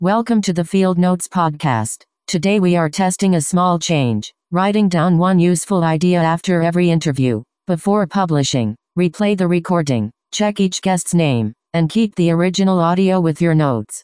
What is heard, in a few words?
recorder practice